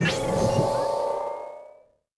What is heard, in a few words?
start, ships; Quantum Redshift; kaitlyn